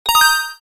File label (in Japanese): ketteion